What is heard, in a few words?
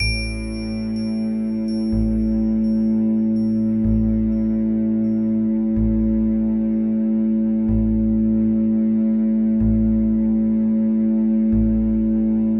Zen Wave Triad in A; Mike Cirillo; Musical Self-Actualization; Mike Cirillo Method TMSA